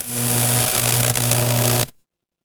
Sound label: fire electric arc